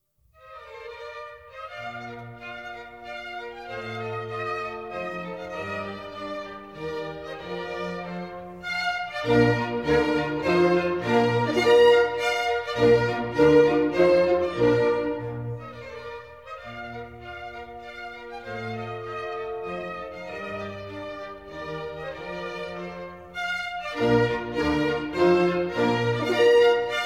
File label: Minuets, Bb Major; Mozart; Dances and Marches